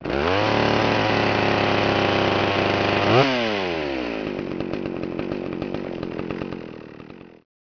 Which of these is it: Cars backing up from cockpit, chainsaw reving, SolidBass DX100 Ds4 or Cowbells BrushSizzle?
chainsaw reving